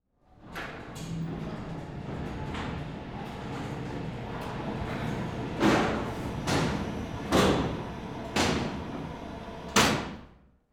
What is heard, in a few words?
Cell Doors Open Electronically Distant; Emergency; AdobeCC